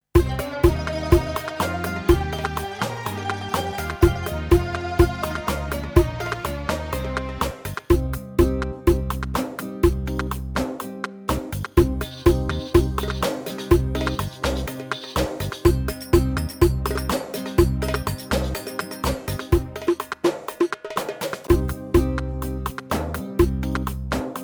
Masmoudi; Demo Styles

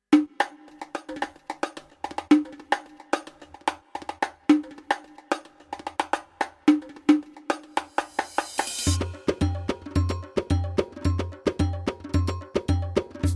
Solo Tabla

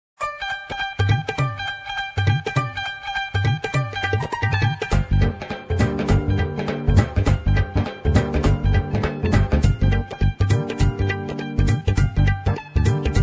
BUNGABI; iranic; pa80; korg

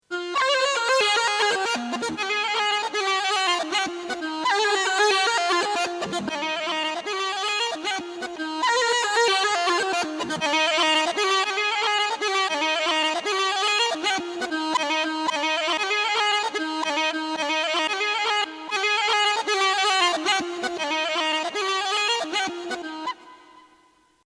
Mezwed loop; korg; EXB Solo Instrumente

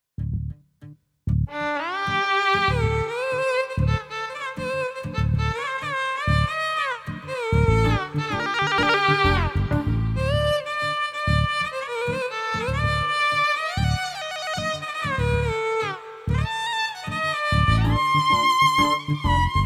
Soft Violin; Pa2X

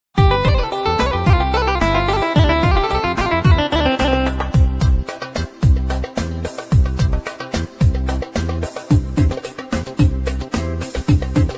Masmodi Sagir, arabic; pa80; korg

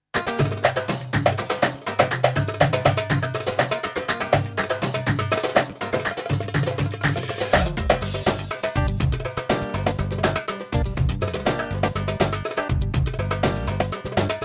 Nawari

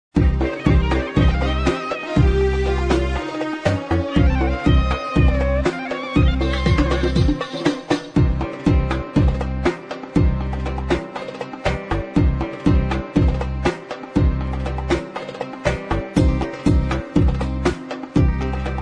masmodi, arabic; pa80; korg

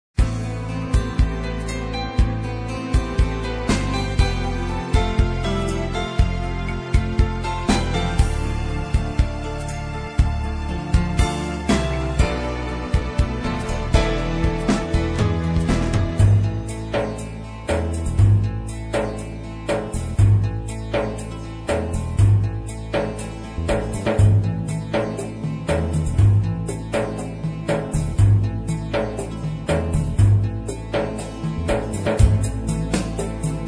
slow, turkish; pa80; korg

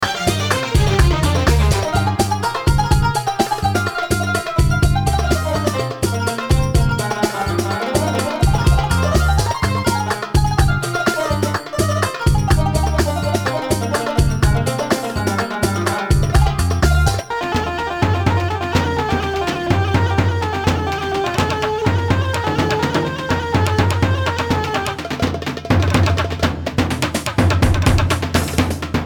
MIX STYL

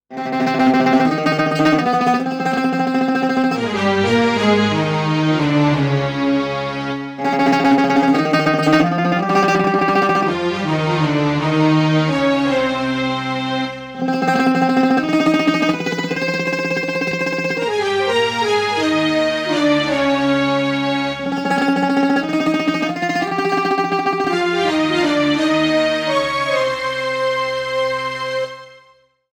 Orch; Pa2x

